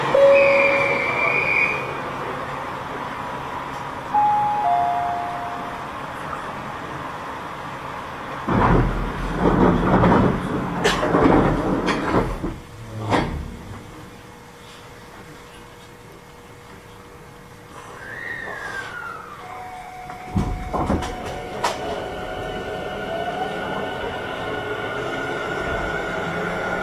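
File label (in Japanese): 走行音, 50000系; Tobu; 鶴瀬-みずほ台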